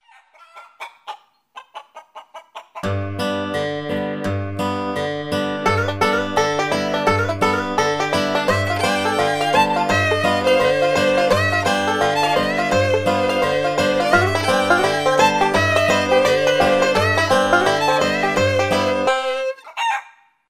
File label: farmhouse, ringtones; Windows Mobile